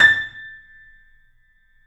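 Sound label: piano, A, right; InVision Interactive - The Piano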